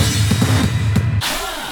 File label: Fill 140 BPM; Vengeance Packs; vengeance essential club sounds-3; VEC3 Loops 140 BPM; VEC3 Fills 140 BPM